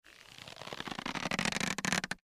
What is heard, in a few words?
MedicalScientific; Soundfx III